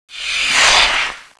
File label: prison